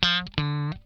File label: lick scale, funk guitar; Zero G - Funk Guitar